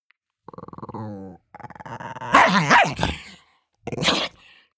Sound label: chihuahua growl bark; natur-tiere; hunde